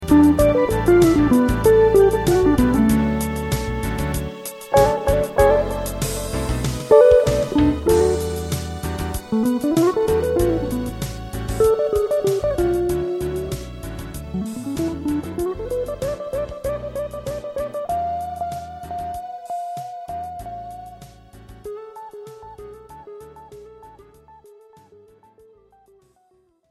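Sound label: octave plus, guitarist, jazz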